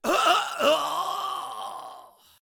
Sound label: Death Vocals; Teacher